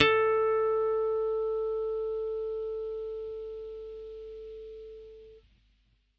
D7 fret, acoustic guitar harmonics

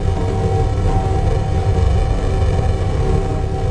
crunchy big thick pad; LOOPING AMBIENCE

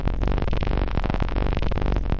grinding crackly loop; LOOPING AMBIENCE

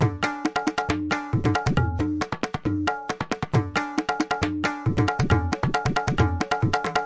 tabla beat; 136 bpm; 131 to 140 bpm; jugalbandhi

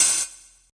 crunchy kit crash; STYLE KITS (Hip Hop, Etc)

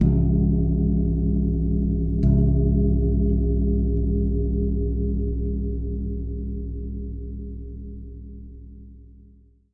Long Gong Multi Hit; Longevity Gong; ETHNIC and WORLD PERCUSSION; Longevity Gong by Amani

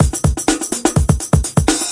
125 bpm crispy funk beat

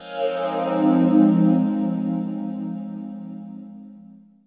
rounded edges seventh, pads

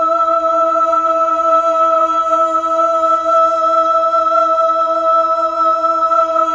Fem E4, Mellotron female choir

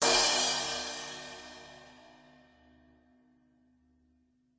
Hard Rock Crash, DrumKit; REAL LIVE KITS; Osama Qureshi DrumKit

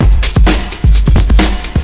130 bpm chugga chugga, drum loop

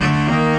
orchestra string hit D; Orchestra String Hits; PADS and STRINGS

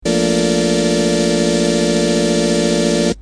farfisa chord g min9; Pads

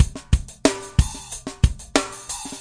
92 bpm funki sharp, drum loops and breaks; 091 to 100 bpm

